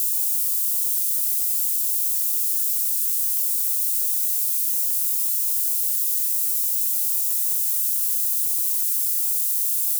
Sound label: noise hp